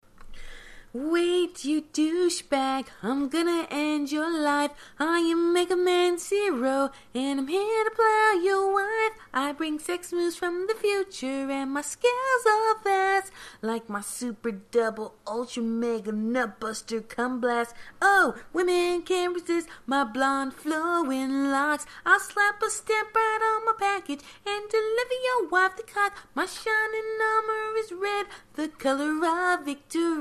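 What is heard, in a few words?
singing, acapella, female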